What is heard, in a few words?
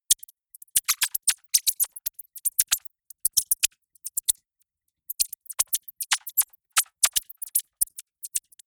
electronic bird chirp long